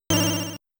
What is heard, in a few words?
Giga, Mega Hit Sound; Motos